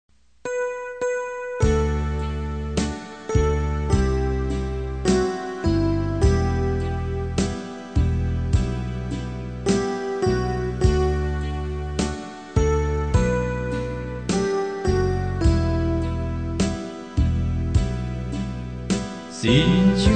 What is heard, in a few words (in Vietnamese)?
BASS